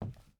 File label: footstep hide wood walk; Rust